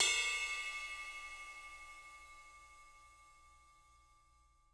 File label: rides; JZ RIDES; Living Drums; Sampleheads; Peter Erskine